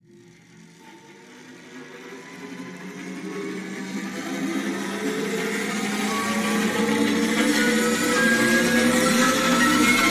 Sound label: Scary Unnerving Riser